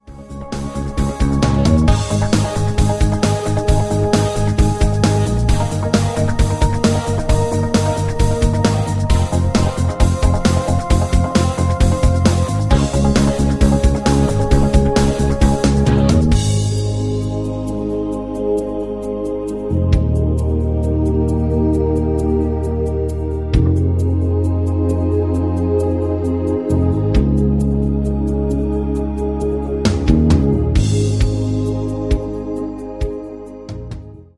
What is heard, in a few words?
rhythm